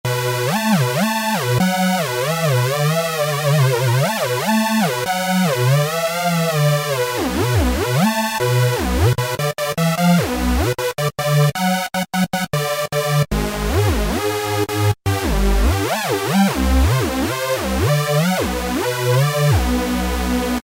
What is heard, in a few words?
Hard House Lead, Korg microKORG; Factory Voices